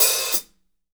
HAT OP CL, Drumscores, KITS MEDIUM; 90s sample CD

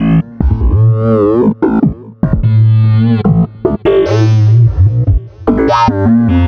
Synth Bass

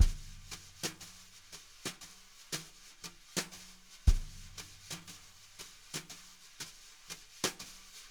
jazz brush loops

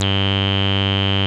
MOOG HI G1, ANALOG BASS; Music Store - Claudius Diemeter Schwerlos

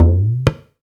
Talk Drums

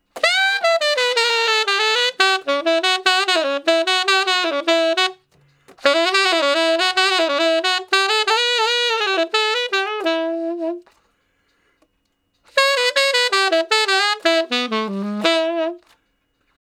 Sax Slo Blus (E IV, IV, I, I) pickup, Ten Sax; Solos; Crimson Blue and Fabulous Horncraft 4 RnB; Horncraft for R&B

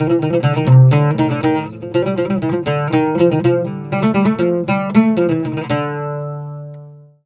120 OUD, Mediteranean Traditions

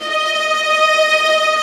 Viola Trm D#4; Digital Sound Factory Virtuoso

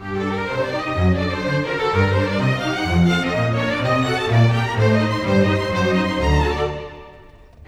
Strings, Orchestral; Sonic Foundry

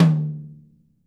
mid tom

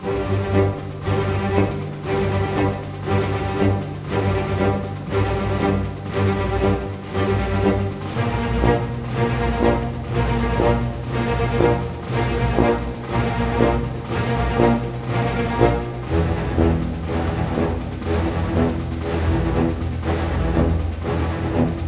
SNAR, Snare Army; East West - Scoring Tools

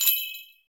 Bells Chimes; NorthStar - Global Instruments VOL-2

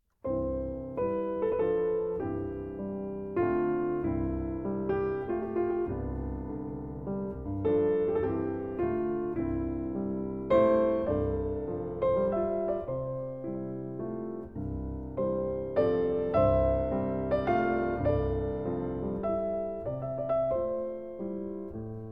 Greek Melody; Fourth Way

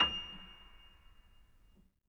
MONO, Acoustic Piano

